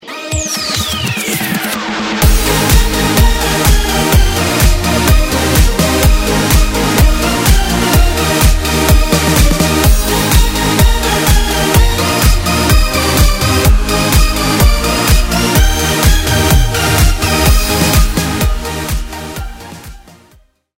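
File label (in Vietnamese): EDM năng động hướng lên nhịp điệu DJ điện tử catwalk sôi động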